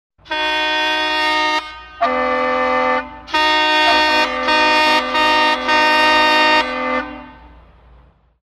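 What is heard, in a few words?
Car horn sound, klaxon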